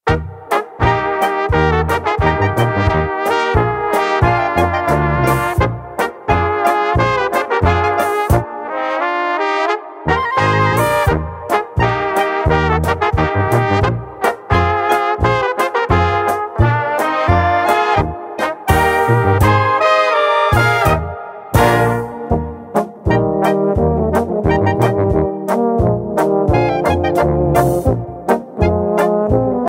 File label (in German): Rot Gold Polka